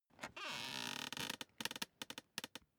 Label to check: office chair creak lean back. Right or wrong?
Right